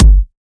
reverb kick drum sample g key, Royality free bass drum sound tuned to the G note